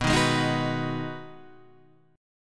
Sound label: B 6th B down Guitar Drop D